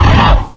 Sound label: pain, strogg; Quake 4 Alpha; id software; tik